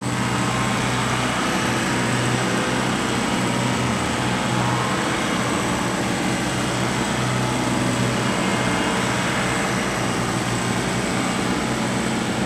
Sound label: Engine Distortion Interference; MatiasMacSD; MENTAL STRAIN